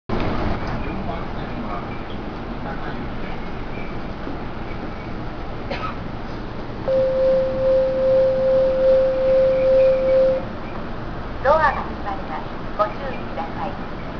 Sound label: buzzer, E231; JRE